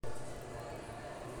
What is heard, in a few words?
board sound